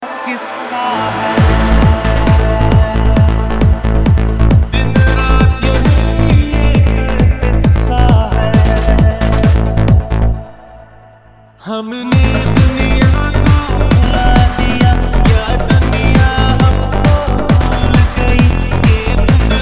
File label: acid jazz